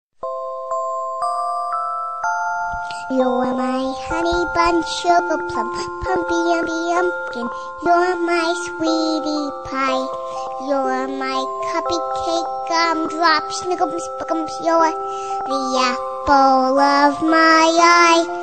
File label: Cute Song